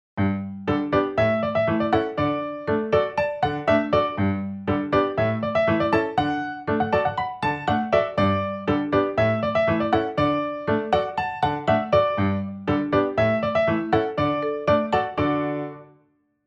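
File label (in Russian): cheerful rhythm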